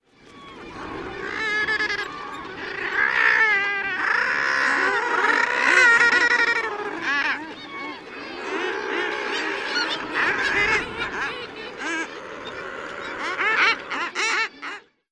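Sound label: colony sounds, Common Murre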